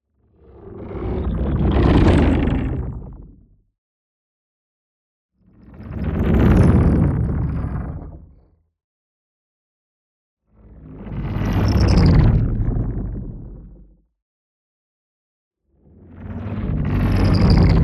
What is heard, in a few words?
WHOOSH LARGE Kinetic Discharge; boom library; Matter